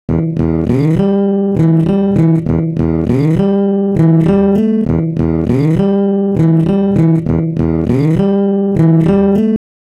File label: bass, pitchshift; ik sampletank